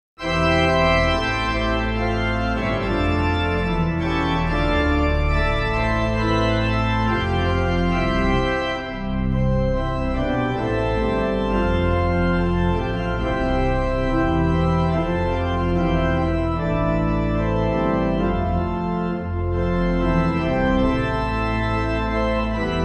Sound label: organ, hymn; jerusalem; Hymnal 1982